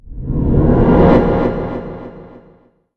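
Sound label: Colosseum Appear; Fly For Insanity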